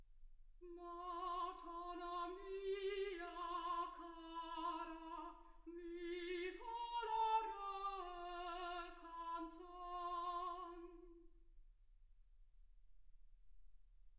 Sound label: soprano